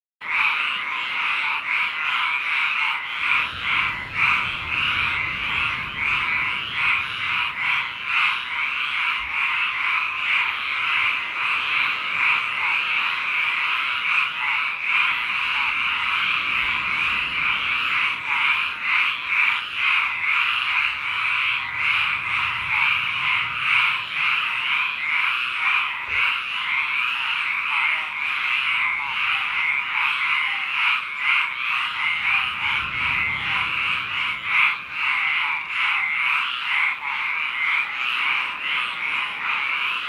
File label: frog lake; animals; best service Blue Box